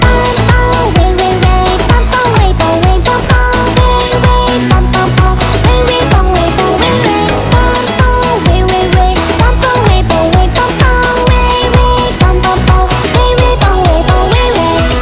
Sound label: RINGTONE